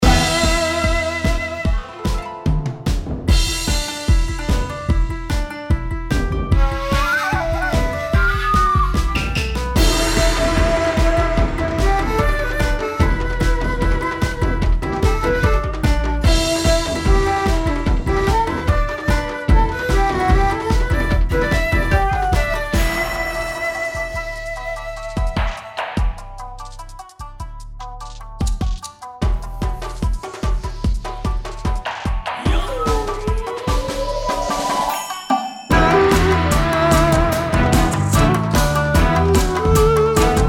loop 148BPM

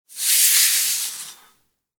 Sugar sticks; lifestyle

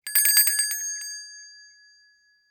call bell; lifestyle

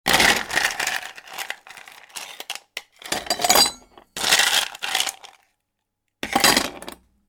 Put ice into the shaker

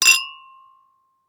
Wine glass Cheers

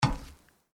Footsteps Iron plate One

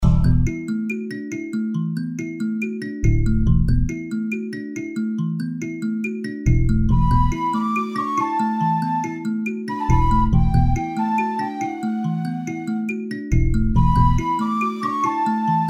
fantasy 140 BPM loop

